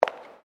FOOTSTEPS WOMENS HIGH HEELS CONCRETE STEP

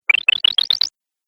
Fantasy Mage Spell, magic; Magic Sound Effects

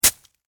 Weather Snow Impact Ice Pick

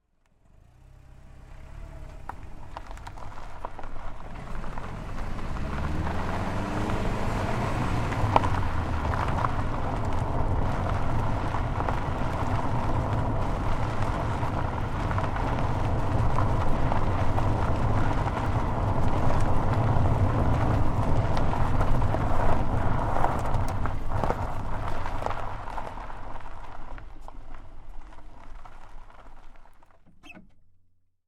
Car, Tire, Onboard, Gravel-Dirt, Roll, Med; Vehicles; Big Room Sound

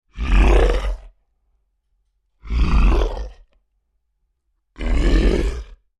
Creature Large Grunts Aggressive; Monsters; Horror; Big Room Sound

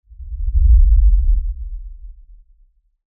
Lfe Rumble By Swell Low Bass Movement; Blastwave

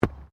SOCCER BALL HANDLING; Soccer ball sounds; Sports

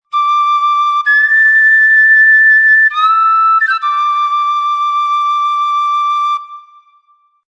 Irish Flute Melody D 130

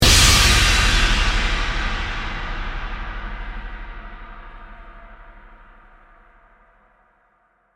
Hit Cymbal Crash, Resonate; Production, Element, Imaging, Accent, Transition

